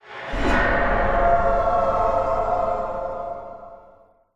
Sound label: Death; beyond good evil